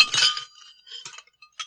dishes clatering; DeadFright

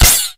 Gun, Stun, Single Shot; gun and weapon sounds; captain audio; fully loaded

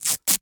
Duct Tape Tear; Miscellaneous; 1001 Sound Effects

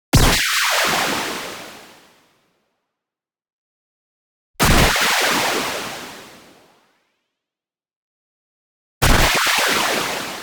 IMPACT WHITE NOISE Old School Punch; boom library; Anime Essentials

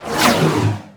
Pickup Scifi; SFX Kit

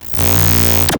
Esthetic Noises; Plastic Surgery

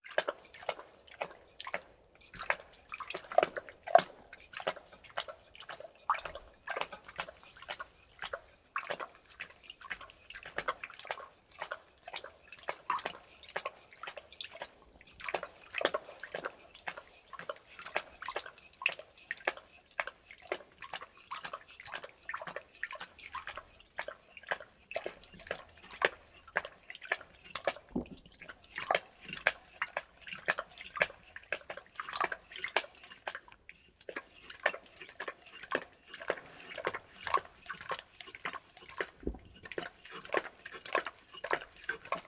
Siberian Tiger Drinks and Licks Water; Tigers; Sound Ideas

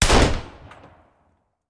Hand Gun; Sound Ideas; Guns

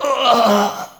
Hit; Blind Quest - The Frost Demon

